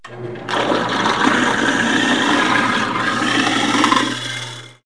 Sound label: Garbage Disposal; KABOOM! - Wild and Wacky Sound Software; Household; Kitchen